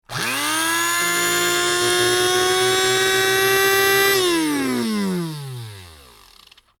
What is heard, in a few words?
Household Coffee Bean Grinder Motor Only Start Run Stop; Appliance